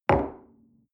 coffee place table soft short, restaurant cafe

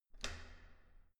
entrance door handle up hard

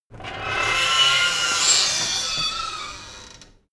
metal door screak thriller close

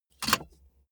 car bmw e46 interior safety belt insert clink

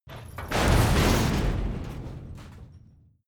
metal long pieces, industrie wirtschaft metall

